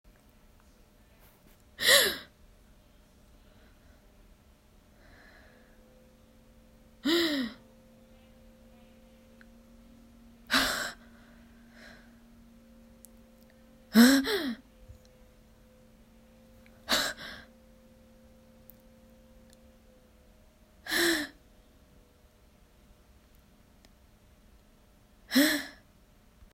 Surprise Breath

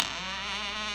Door Creaks

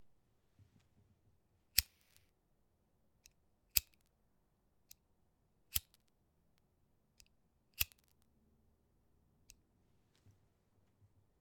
Flicking Lighter

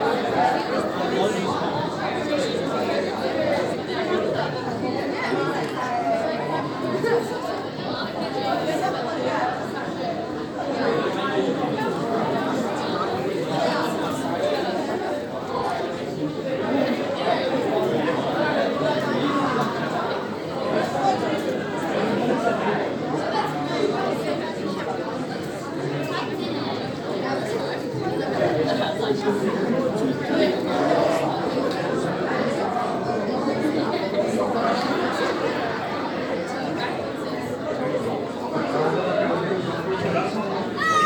Bustling Conversation Loop

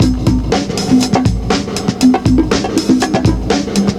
Classic Breakbeats; Processed

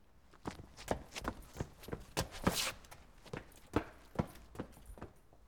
Man Walks Run Manholes

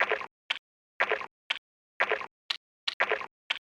Water shakers

